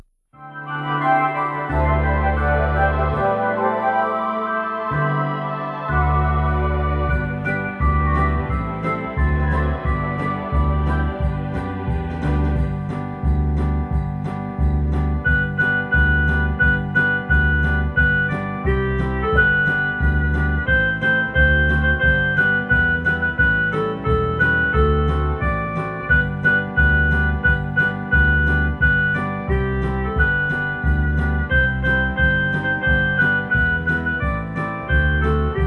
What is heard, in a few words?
Jingle Bells, keyboard klavier